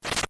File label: throw hammer